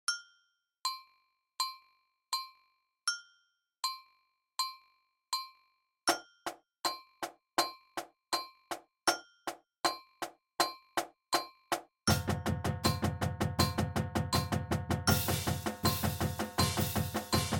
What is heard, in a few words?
Stick Control 80bpm, drumline